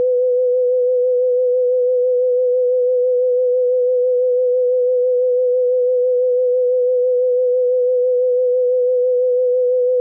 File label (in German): Frequenzmodulation; Вибрато: частота основного тона 500 Гц, частота модуляции 50 Гц, частота вибрато 6 Гц